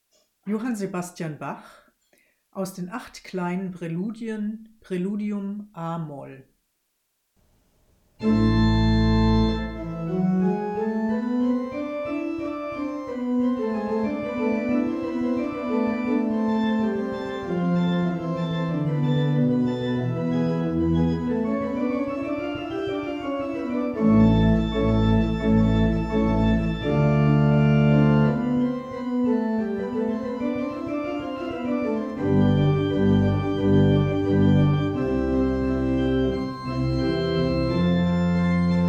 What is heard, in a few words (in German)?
bach praeludium a moll